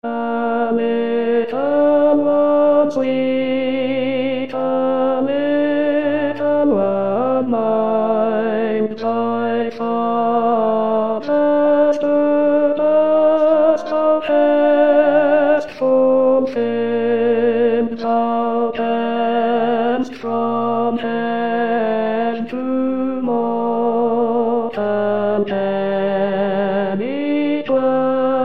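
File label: Tenor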